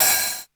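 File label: OPEN HI HAT; DDD1; KPR77; Crypton - DrumBase5000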